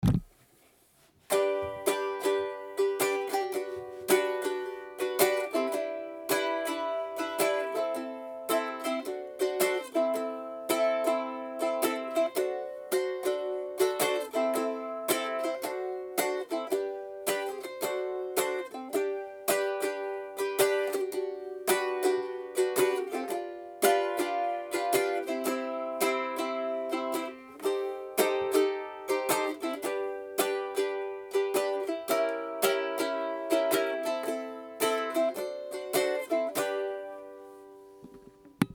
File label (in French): 8 corde